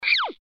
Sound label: zoom; cutiepugs